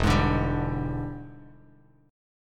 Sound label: E 7th Sharp 9th E down Bass Standard; E7#9 strummed; JGuitar